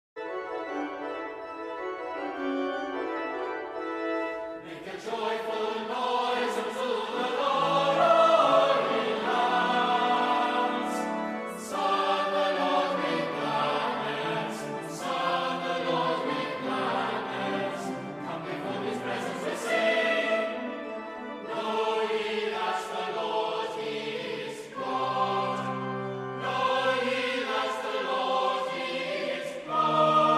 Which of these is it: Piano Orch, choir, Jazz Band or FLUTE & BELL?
choir